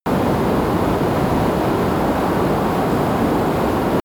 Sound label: balloon fly fire steady